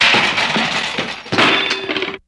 Metal Junk Crash

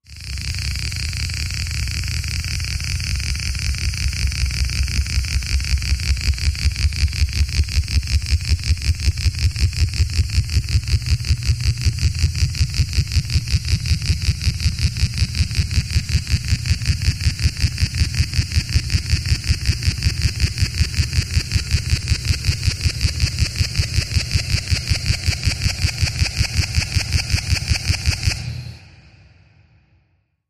Machine, Deep, Alarm Rise, Air Release; Increasing & Reverses

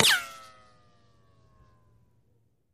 Bullet Ricochet Sin

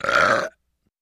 Belch Loud; belching

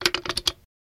Metal Clinks Moveme, gears

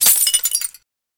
Glass Crash Throw Ma

